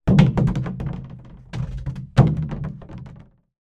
industrial container large plastic empty drop impact movement; zapsplat